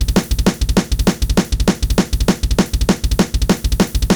Drums; deadfoot drum training; Drums 90 Percent

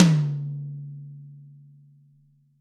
Birch Tom Hard; Drums; apulSoft apTrigga2; Steven Slate Drums

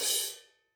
Crash Choke, cymbal; apulSoft apTrigga2; Multi-Velocity Cymbal Samples

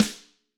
Snare Hard

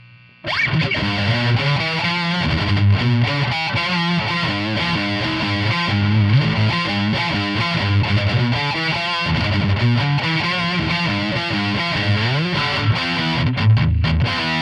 riff

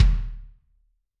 Kick Hard, Drums; apulSoft apTrigga2; Steven Slate Drums

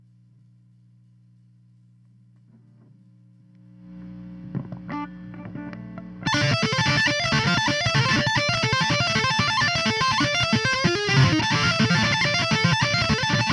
guitar solo

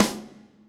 Snare; apulSoft apTrigga2; Multi Velocity Drum Samples

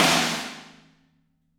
Snare Crack

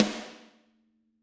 Snare Crack

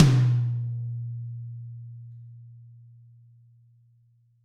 Maple Tom Hard, Drums; apulSoft apTrigga2; Steven Slate Drums

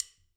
stick click; apulSoft apTrigga2; Multi-Velocity Cymbal Samples

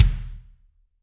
Kick Hard, Drums

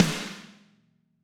Snare Hard; Drums; apulSoft apTrigga2; Steven Slate Drums

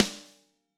Snare Crack

